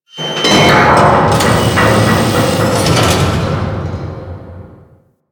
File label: Ocean Machine; Ambience; Warframe; Grineer Ocean